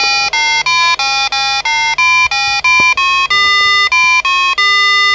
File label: frere jacques short; maximus